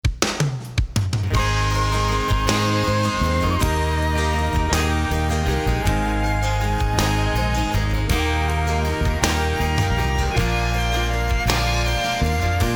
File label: samson bass